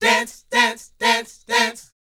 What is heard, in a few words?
A#A, Vocal, DANCE 120; ILIO; Vocal Planet VOL-4; R&B & Dance